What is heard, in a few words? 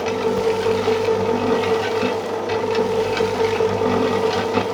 concrete mixer; tile